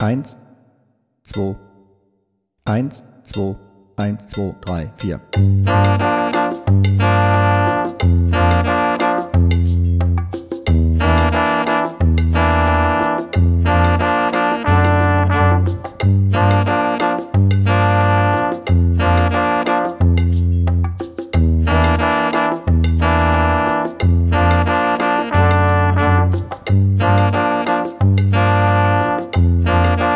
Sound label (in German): ohne Drums